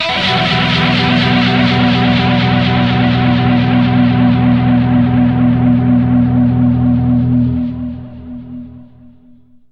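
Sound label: dirty guitar, destination rotation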